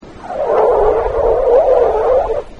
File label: friction Wave Sound; 浜で鳴り砂を手で擦った音; 43.75Hzの音は、波の音である; 琴ヶ浜; 網屋